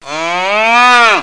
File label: moo; PUZMANIA